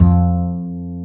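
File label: double bass F2, Jazzistic; Univers Sons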